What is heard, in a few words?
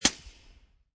Suppressed Pistol Bridge, Cannon; 44 Suppressed Pistol Bridge; War; VRSonic